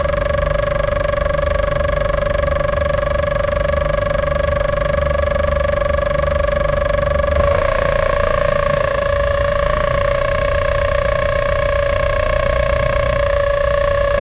fierce howl turbo screami, engine sounds

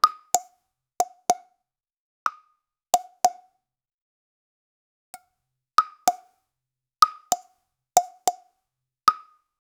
light boing sounds for ea; physical

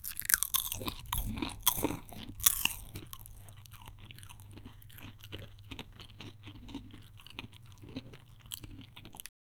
asmr chewing food; bite

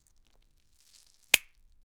slapping squelching sound, slime

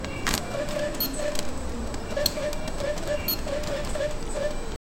conveyor belt moving low, buzzing

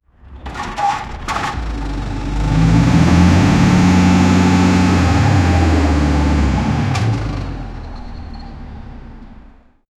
loading dock, engine start; location

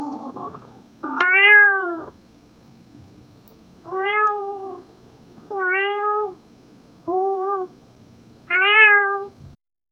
soothing cat noises, feline; soothing cat noises for c

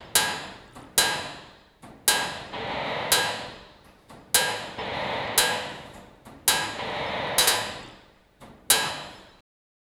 sounds of the active work, manufacturing

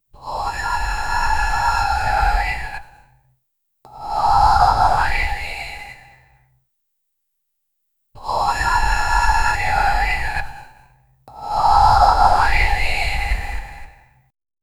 subtle vocal whispers; emotional

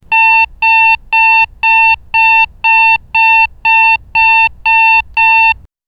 a steady rhythmic beep, medical

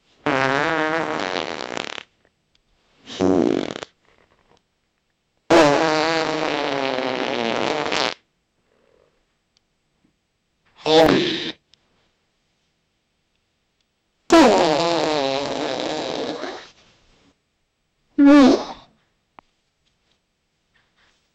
farting; farting on command

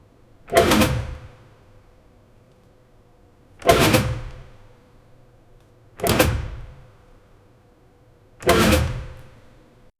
air vent mechanism open

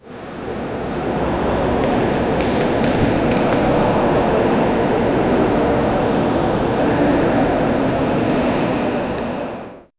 indoor exhibition hall am

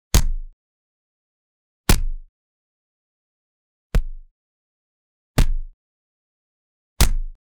boxing punch

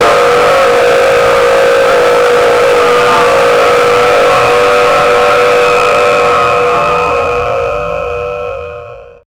a hellish demonic scream; fleshy

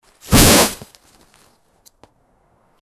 sound of a jacket being, clothing

